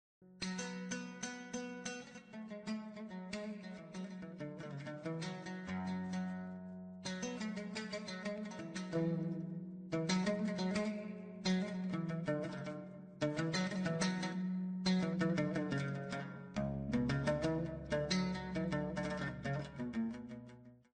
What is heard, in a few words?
music of Morocco